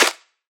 clap, drumkit; drum-machine

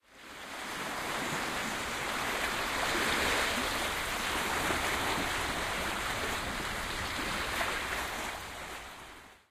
AMB Intro; ardentryst

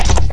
notify; redeclipse; weapons; rocket

